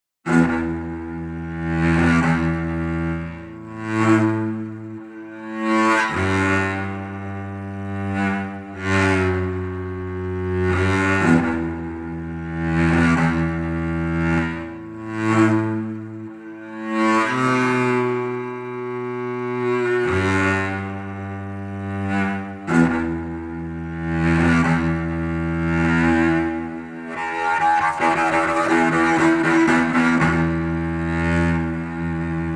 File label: The Man Who Played One Chord